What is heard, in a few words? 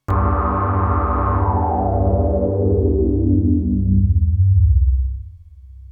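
4 Pole Filter sweep OB Xa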